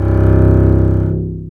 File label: STR ARC BS, Cb Bowed, Cb1 mf vb